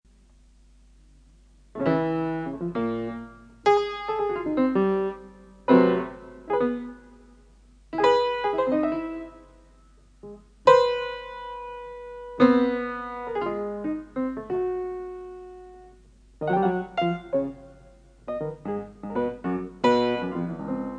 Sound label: solo piano